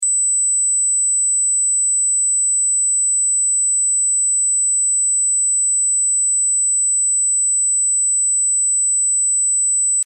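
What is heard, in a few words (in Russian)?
ultrazvuk 8000 Hz slyshat vse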